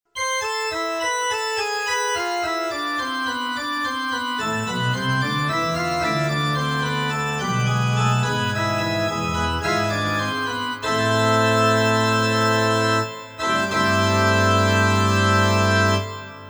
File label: Pipe Organ